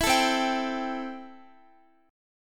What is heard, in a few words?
C 6th C down Guitar; strummed